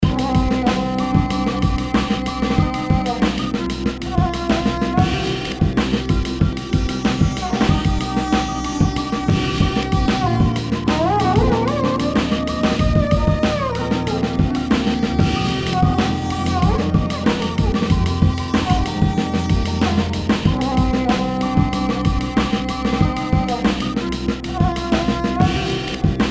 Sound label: beat test